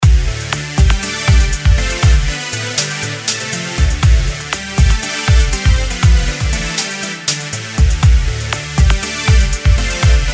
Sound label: simple bgm loop